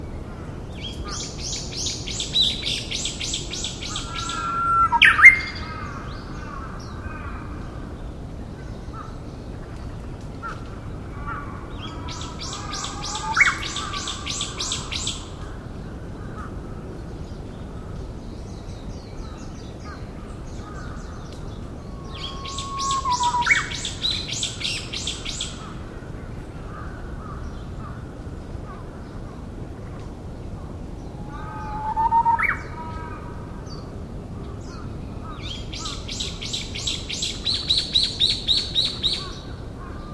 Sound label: Japanese Bush Warbler